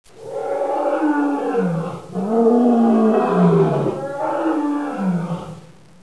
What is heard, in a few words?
Lion roar